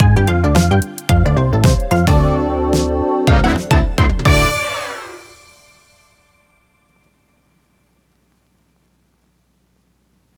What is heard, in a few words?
End Stinger; boomerang fu